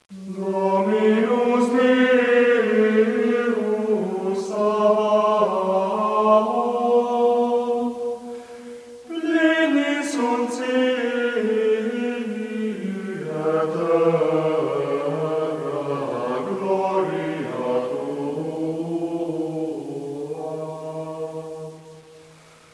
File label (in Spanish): canto gregoriano